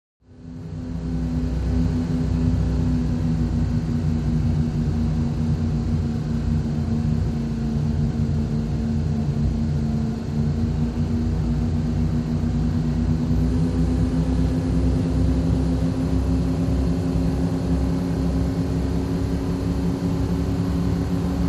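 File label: Power Boat Interior Cruise, off shore power boat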